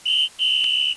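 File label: whistle, fotbal; cstrike; hellforce jb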